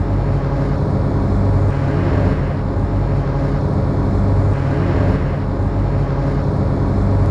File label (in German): MASCHI; Best Service; Advanced Mega Effects